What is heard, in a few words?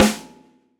snare, gretsch kit